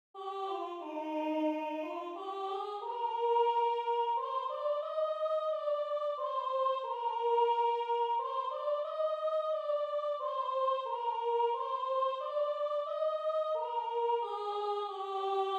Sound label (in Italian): Soprano; Noel (Tradizionale); Natale